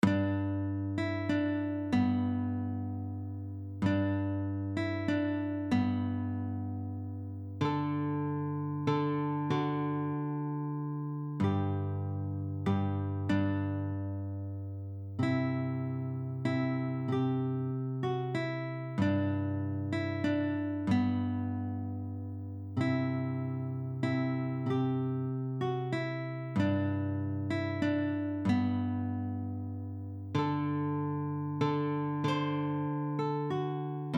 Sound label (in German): Silent Night nur Melo Bass in G; Christmas